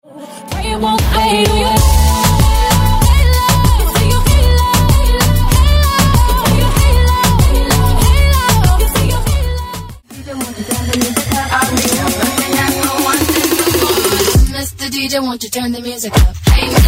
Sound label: Throwback Moombahton Megamix